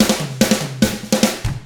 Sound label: rock drums